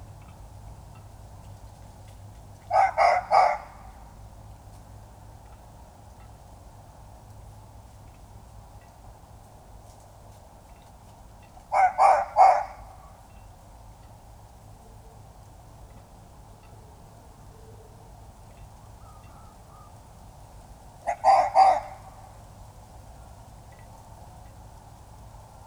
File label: red fox vulpes vulpes